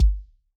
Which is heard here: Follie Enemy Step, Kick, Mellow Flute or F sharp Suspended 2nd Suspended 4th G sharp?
Kick